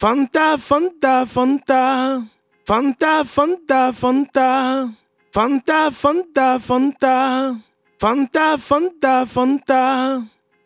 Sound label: 90, vocal clean, Fmin